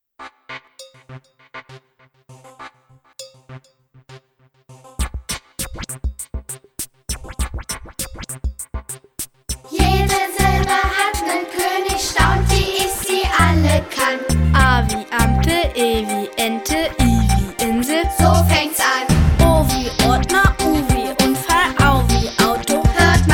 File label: rap; deutsch